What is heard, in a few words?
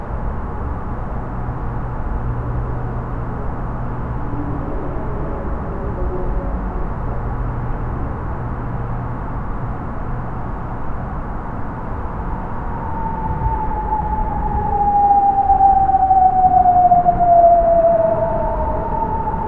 ambient nighttime city sound